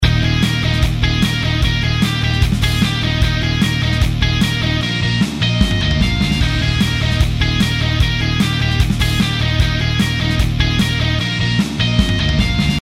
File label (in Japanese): ロック